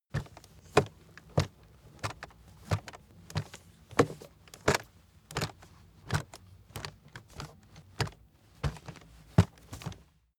aluminium case walking

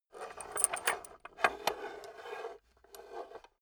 metal scrape on wood jingle

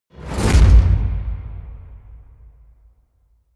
mid flame

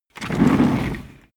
office chair worn trundle hard

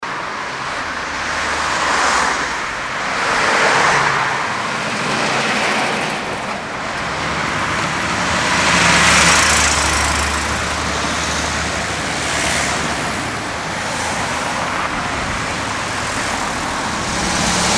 city street close cars by

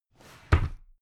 drawer cabinet carton close heavy halfway hectic

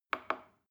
plastic button small click soft slow

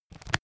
book browse crackle

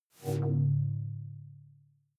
sci-fi critical bell clean

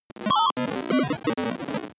broken computer random short

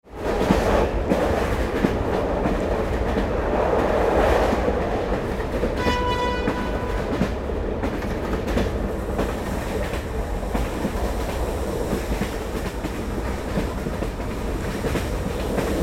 train passing quickly

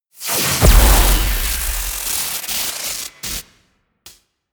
disintegrate

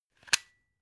plug unplug single

